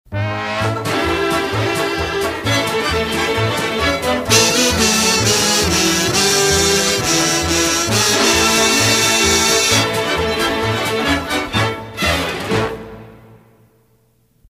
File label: Kazoo oo oo; PAMS Numbered Series; WABQ